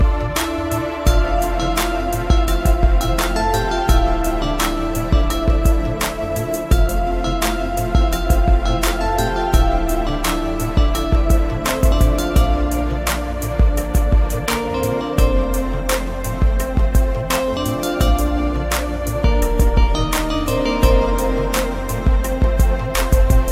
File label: Ringtone; زنگ فلوت عربی شاد